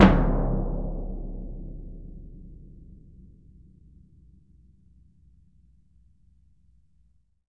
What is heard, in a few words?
BS DRM F; ILIO; Synclavier Percussion 2; World & Orchestral